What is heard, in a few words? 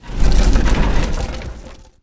catap move